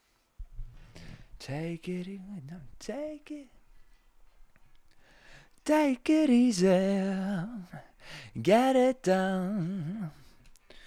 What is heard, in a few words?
vox; logic